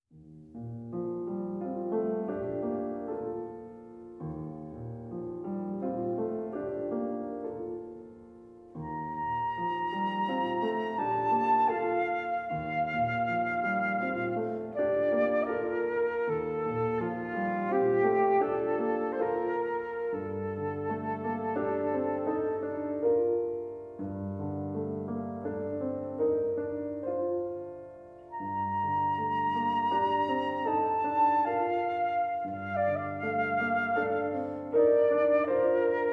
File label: Flute Sonatina